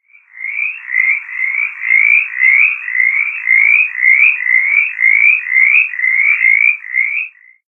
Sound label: Mountain Chorus Frog; herps of NC; anurans